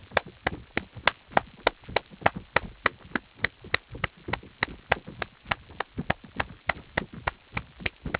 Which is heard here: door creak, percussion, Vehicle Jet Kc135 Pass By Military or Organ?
percussion